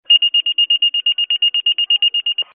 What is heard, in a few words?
fire pager